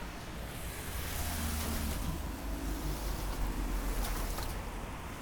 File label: rain traffic; 99Sounds; Rain And Thunder